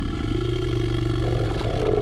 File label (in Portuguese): som monstro RPG